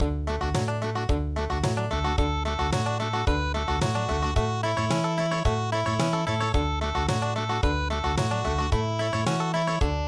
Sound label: Country